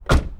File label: car door close; Almost Maine